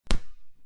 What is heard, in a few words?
bounce; punt